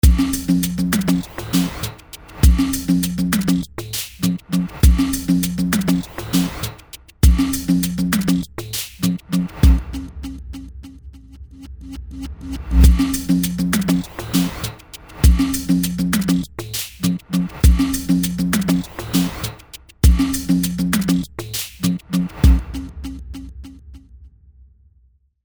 Tensed Up

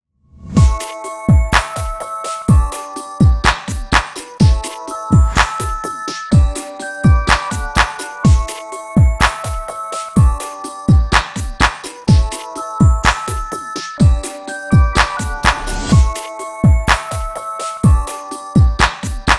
The Happy Clap

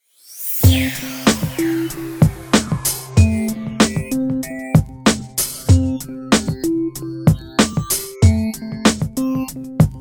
BED Mellow; Mellow Outside

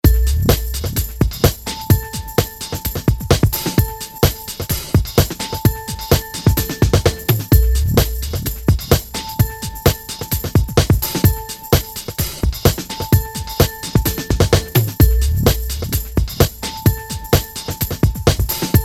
BED Chime; Chime 2 Make The Donuts

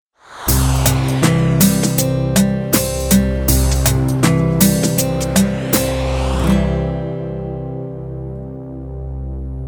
bed calming logo

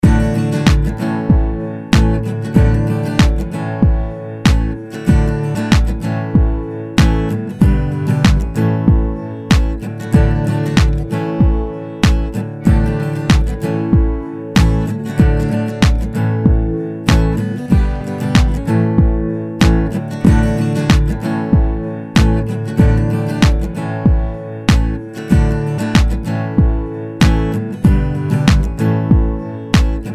Heart Strings

